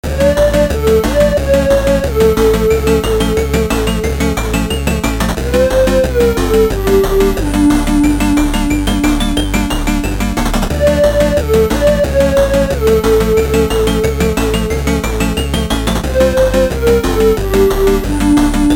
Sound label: Boss Spooky; Witchcrafty; PS Vita; Xbox Series X|S